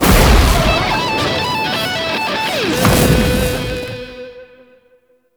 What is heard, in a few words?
Solo Cast; Warframe; Temple